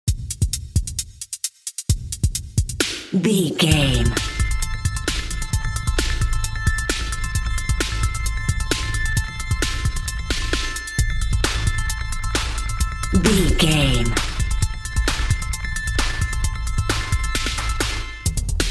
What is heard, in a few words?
intense video game level no melody